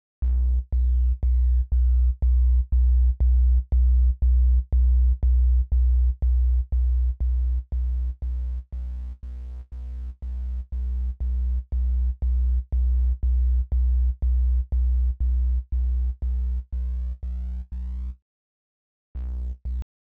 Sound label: repeated note problem; arturia